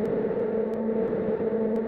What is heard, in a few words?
coco loop